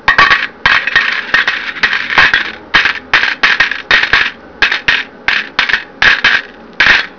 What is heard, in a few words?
Money; Slot Machine